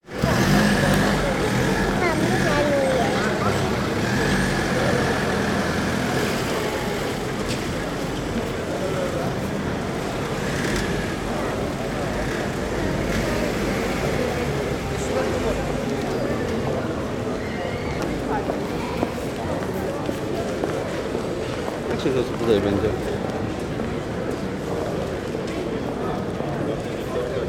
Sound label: City Center Ambience